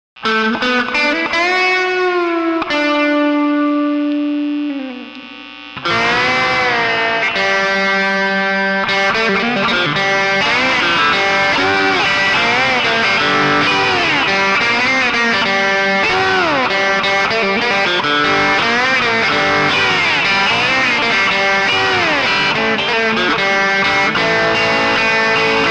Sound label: guitar box